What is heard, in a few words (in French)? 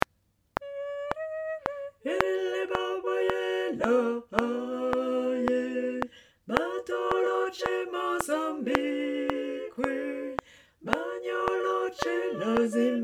basses